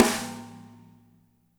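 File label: snare left; Spectrasonics; Burning Grooves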